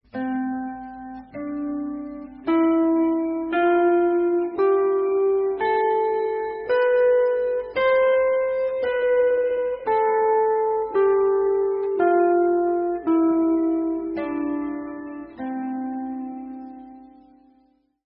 Guitar Scale

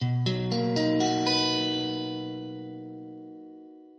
B maj

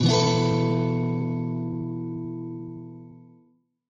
E sus4

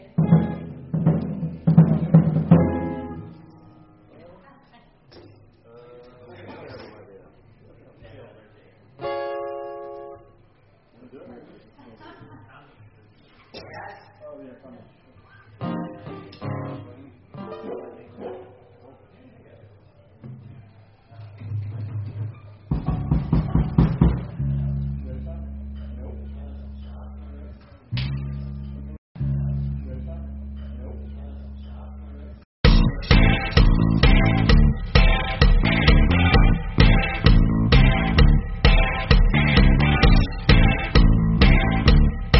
Rehearsal

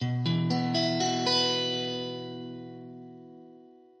B sus4

